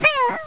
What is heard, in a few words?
die; gubble